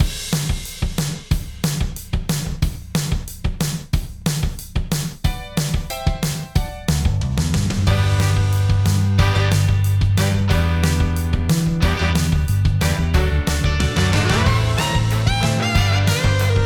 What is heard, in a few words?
Snare Drum; STAR NURSERY MUSIC; LYNN Drum